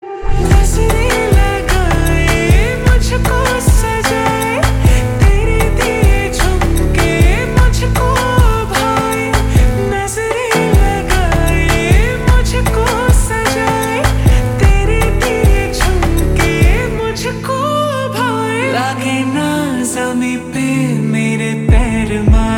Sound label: Ringtone Slowed Reverb